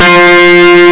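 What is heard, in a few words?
electric guitar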